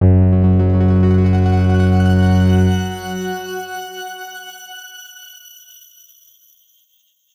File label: X Grain F#1 ff; healing soundscapes; Ableton